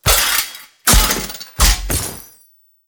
riposte finisher foley; Enemies; Warframe; Duviri; Dax

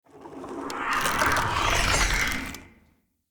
Bow Charge; Weapons; Player; Courage; Bows; Fey Thorn Bow

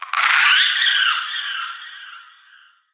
Settlement Creature High Mid; Warframe; Grineer Settlement

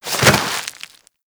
Generic Roll Dirt; Warframe; Characters

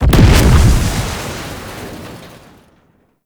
dojo explosion; Mod Quest; Warframe; The Teacher

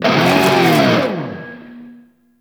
Shred Cast 3D; Warframe; Temple

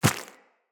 Inf Small Walk Default, Footsteps; Infested

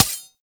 Foot Stab; Warframe; Corpus; Arachnoid Hunger; Abilities

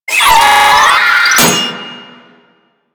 Proto Infested Volatile Runner Explosion Warning; Warframe

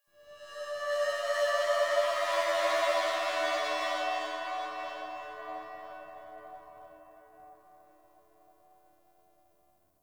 ambient sentient moan; Warframe; Tau

